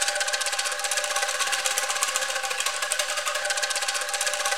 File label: GRATE; Roland LCDP14 Africa VOL-2; Perc FX